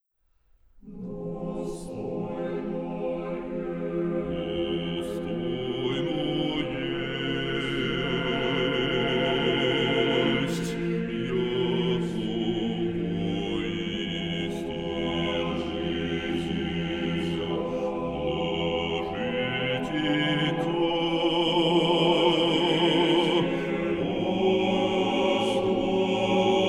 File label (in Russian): Хор Валаамского монастыря, соло